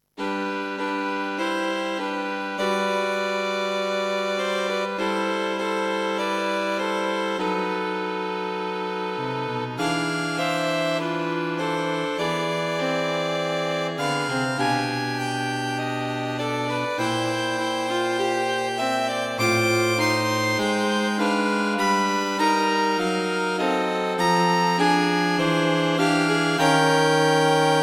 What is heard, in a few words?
String Quartet; String Quartet for Concert performance; A reflective piece for String Quartet; Rich harmonies and a deep texture to bring out the feeling of serenity.